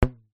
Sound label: elastic ribbon twang; foley